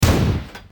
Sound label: canon